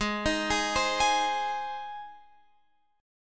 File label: Ab Major Ab, Guitar Open F; arpeggiated; JGuitar